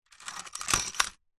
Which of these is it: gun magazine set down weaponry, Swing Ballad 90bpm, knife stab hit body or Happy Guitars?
gun magazine set down weaponry